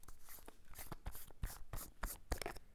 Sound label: Makeup opening